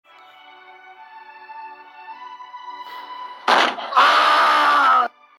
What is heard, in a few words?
ahhhhhhh; gandalf